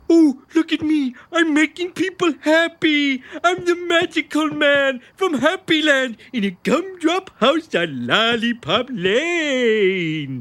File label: happy